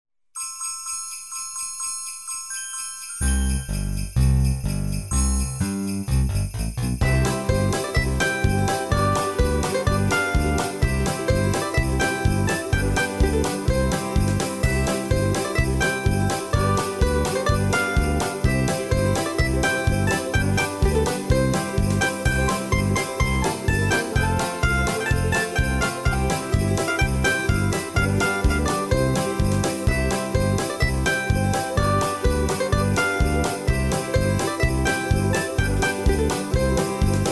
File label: with bells, square dance music